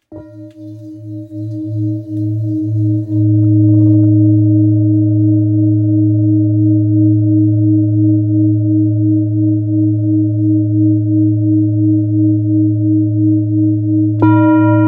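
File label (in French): Bol therapeute re diese, bol chantant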